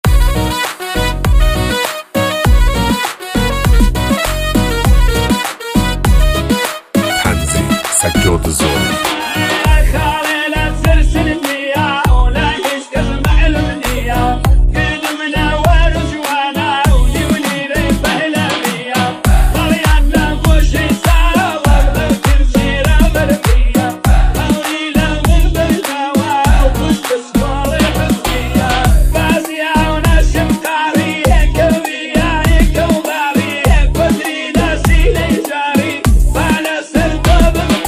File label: Remix